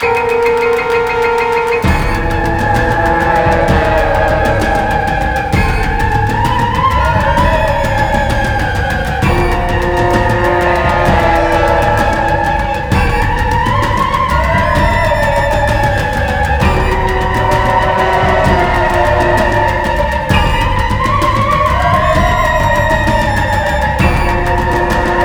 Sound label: witch music; zombie plague